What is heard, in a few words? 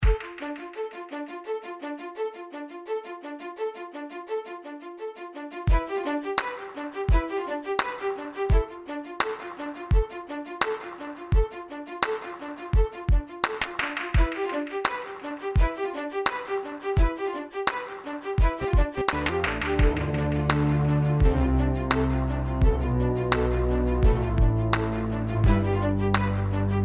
OSCILLATOR Evolution